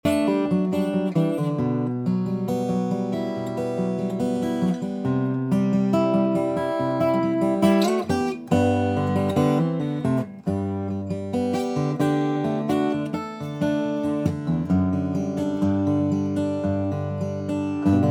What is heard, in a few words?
Grand Aud sample, guitar